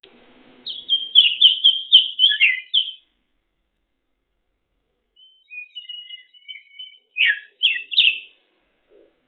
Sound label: a bird saying the word